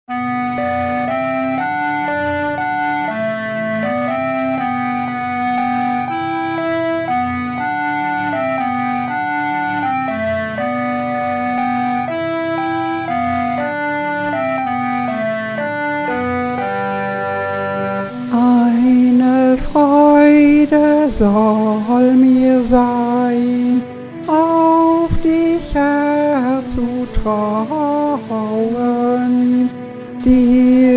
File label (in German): freude alt gesang; Psalm 5, 12+13